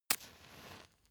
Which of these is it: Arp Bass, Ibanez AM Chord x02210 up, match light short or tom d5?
match light short